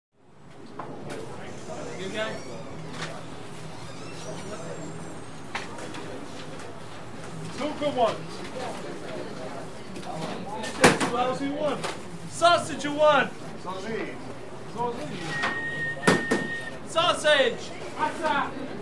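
Restaurant Walla; Pizza Parlor, Close To Counter Man Italian Walla, Calls Out Pizza Orders And Talks To Customers. Door Open And Close With Squeak, Clunks Of Pizza Oven; restaurants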